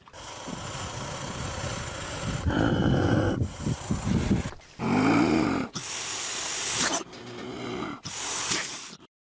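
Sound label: badger; barsuk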